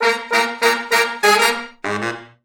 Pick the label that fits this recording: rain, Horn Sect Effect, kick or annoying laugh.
Horn Sect Effect